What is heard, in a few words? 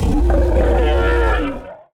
monster